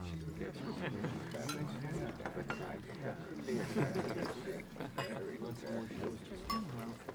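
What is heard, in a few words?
banquet ambience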